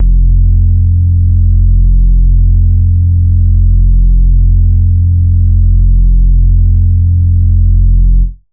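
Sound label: bass sustain deep F; Polyend; ARC Noise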